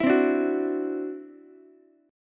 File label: E 7th Suspended 2nd Sharp 5th, E, down, Ukulele; E7sus2#5; strummed